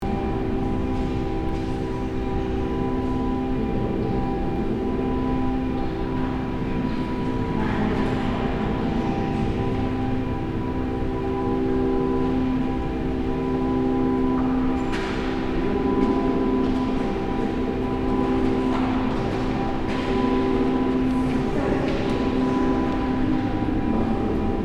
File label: Church ambience inside perspective reverberant loop